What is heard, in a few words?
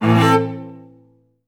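cellos A2; Best Service; Peter Siedlaczek's Advanced Orchestra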